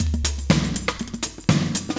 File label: 120, loops; Sound Effect Library